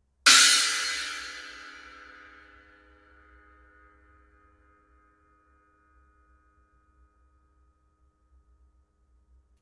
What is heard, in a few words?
zildjian